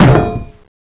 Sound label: landmine drop; fallentitan